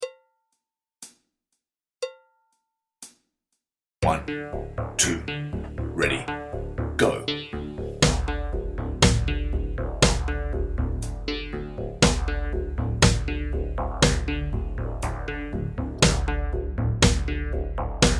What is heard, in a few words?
slow